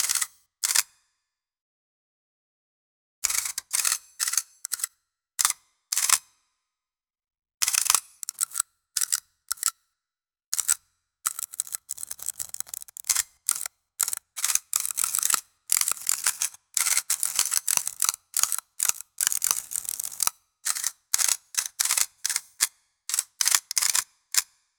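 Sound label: designed professional camera, equipment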